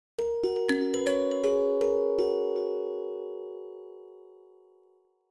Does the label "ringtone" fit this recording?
Yes